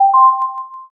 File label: m notify